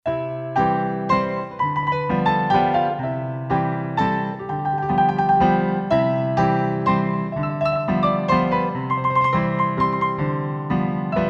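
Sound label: Acoustic Piano; Roland SC-880; Factory Patches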